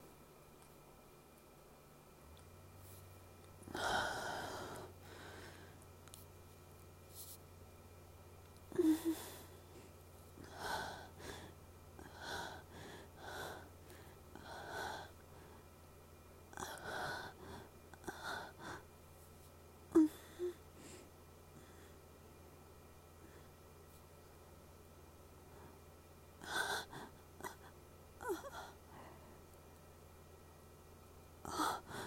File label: Moan Sample, moaning, heavy breathing; asmr; F4A